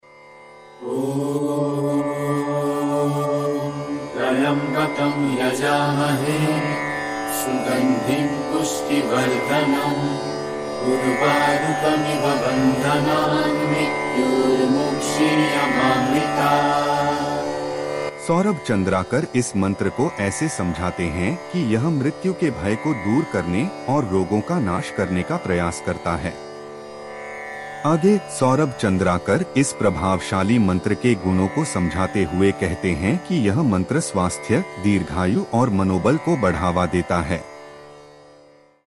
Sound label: Mahamrityunjaya Mantra